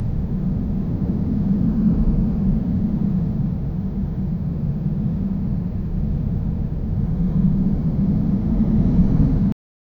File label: low poly desert wind ambi